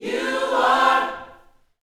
Vocal Gospel PHRASE

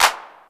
808 CLAP

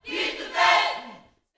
YOUTH CH PHRASE, Vocal Planet Gospel

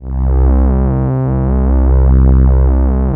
Atmo Pads; Best Service; XX Large

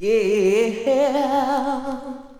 VOC

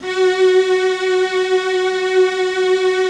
PAD, ATMO PADS; Best Service; XX Large

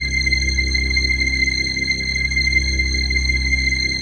PHASE PAD, ATMO PADS; Best Service; XX Large

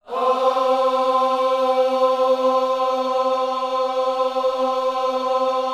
OHS C 4, gospel; Spectrasonics; Vocal Planet Vol.1 Gospel; akai

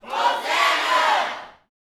"hosana", gospel shouts; Spectrasonics; Vocal Planet Vol.1 Gospel; akai; UYC SHOUTS

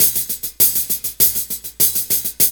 LOOP; E-Lab; X Static Goldmine 2; akai

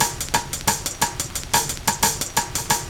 LOOP, DRUM; E-Lab; X Static Goldmine 2

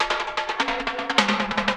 ROLLS; E-Lab; X Static Goldmine 2

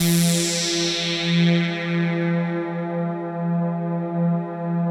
ATMO PADS; Best Service; XX Large

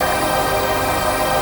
RAVE PAD; Best Service; XX Large; Pads

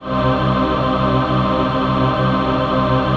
VOICE PAD; Best Service; XX Large; Pads

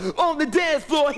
VOC

